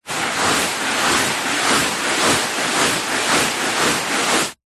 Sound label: household cleaning wiping carpet with dry tissue paper circular motion; zapsplat